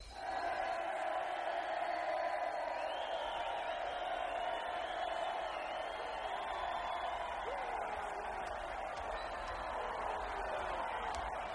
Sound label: Crowd Loop, large